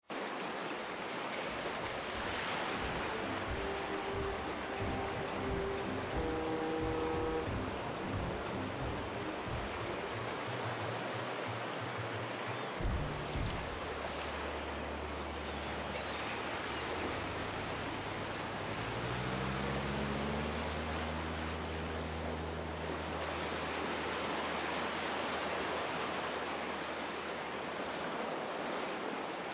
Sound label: ambience location